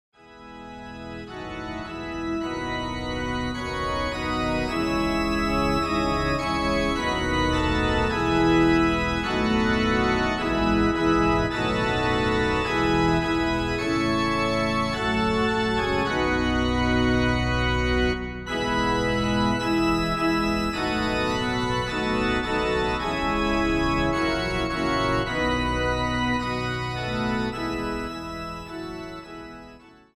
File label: Sample organ